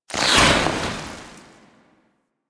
Teleport Burst Out; Warframe; Teshin; Powers

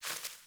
Sparrow One Wing Flaps; Animals

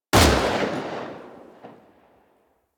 Burst Rifle Shot Secondary; Weapons; Grineer

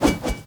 One Handed Axe Spin Whoosh; Weapons; Enemy; Sinecure One Handed Axe

